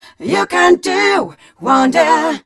f mel c, fem Vocals

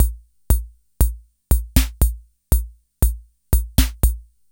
TANGO; INIS - Funky Xtreams

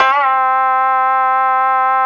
Koto Bend C5, Fairlight CMI IIe

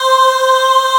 AAH C4, vocal, pop chorus; VOCAL ORGAN

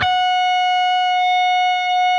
PRS G, Guitars, LEAD

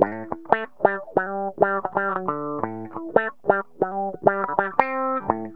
GTR, Funky; Zero-G; Funky Elements

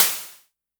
Crashes; LIMAT Div Dept; Drums

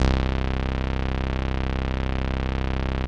505, String Machines

